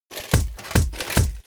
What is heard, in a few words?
Intro Stomp